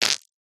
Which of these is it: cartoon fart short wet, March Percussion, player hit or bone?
cartoon fart short wet